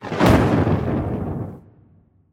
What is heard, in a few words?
Fire; pixelheros